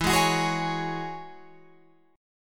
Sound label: E 6th Add 9th E, Guitar Open F; strummed